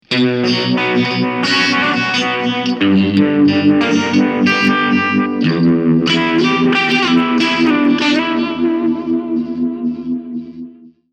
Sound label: vibe verb; ME 50; jimi vibe verb